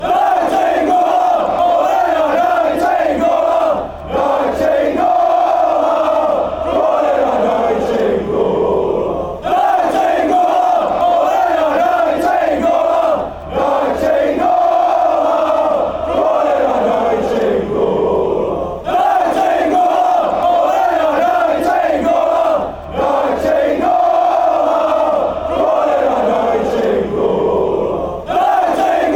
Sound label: cholera, fanchants